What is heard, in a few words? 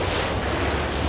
missile thruster; killstreak rewards; predator